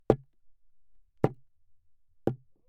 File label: Hit Bucket